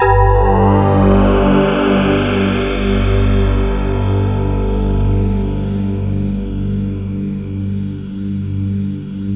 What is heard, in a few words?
WALDORF MW; Rubber Chicken Software Sample Bank